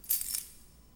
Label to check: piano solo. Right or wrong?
Wrong